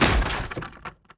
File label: environment object chest breaking